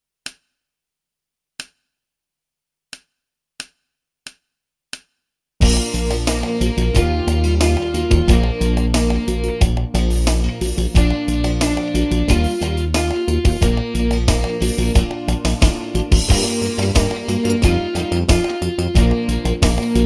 svänglåt, trombon trumpet

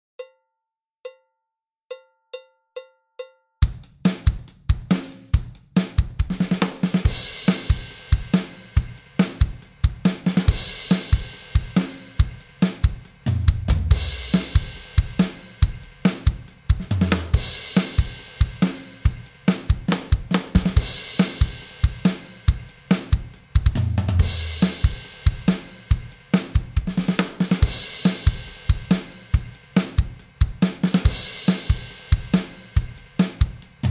Rytmträning 140 BPM

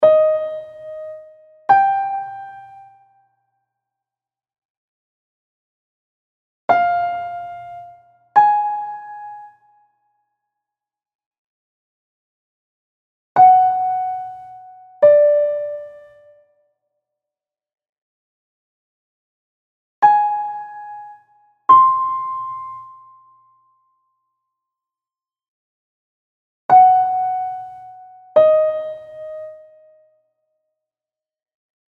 Vilken ton är ljusast Övre registret

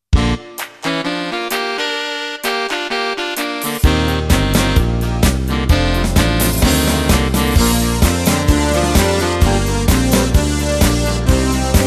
Spanien, trombon trumpet

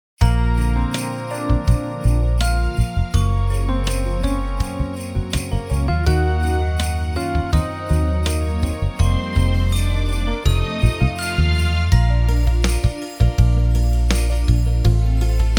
Julhälsning, trumpet

